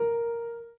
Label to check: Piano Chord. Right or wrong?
Right